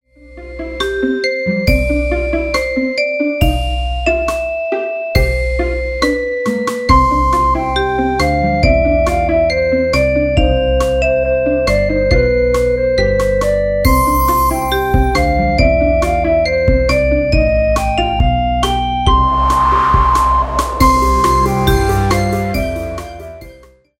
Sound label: Beginning Music